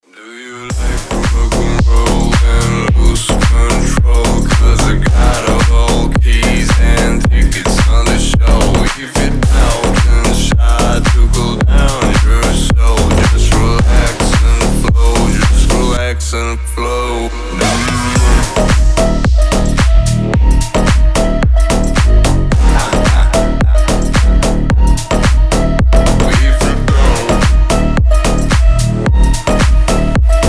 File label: rock and roll